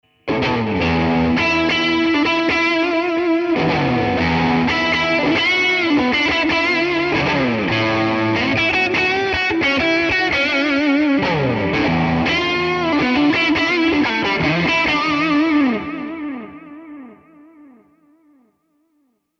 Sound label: Breakup; ToneMasterPro; Vauxhall